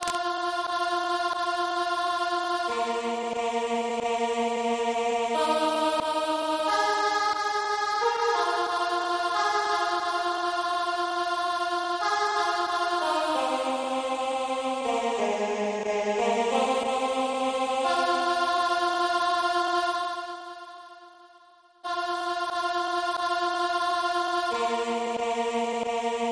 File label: alto; Go Down Moses